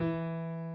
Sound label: key; keySounds